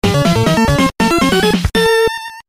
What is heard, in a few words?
fanfare; ninja gaiden